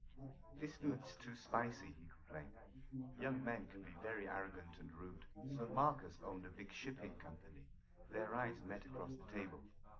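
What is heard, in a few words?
male, live recording 250ms 5cm; SiSEC11; underdetermined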